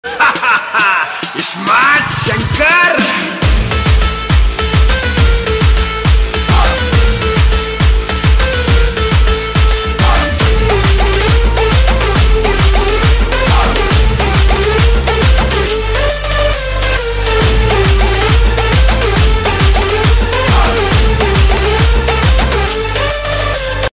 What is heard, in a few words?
dj ringtone bgm music; ismart shankar movie title